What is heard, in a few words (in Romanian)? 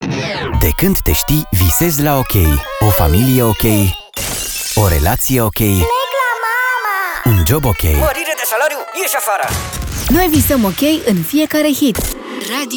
Jingle Generalist; Radio OK Statie de Distractie